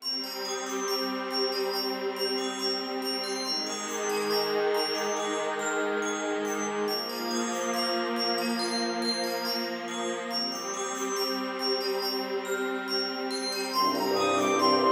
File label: Title Screen; FiE Game; remasterd